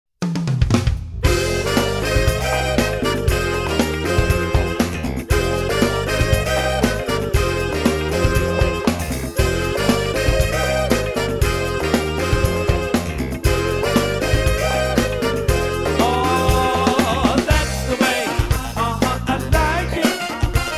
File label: Variety Band; Atlanta